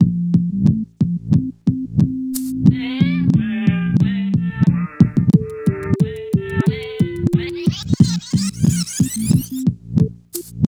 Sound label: Carillon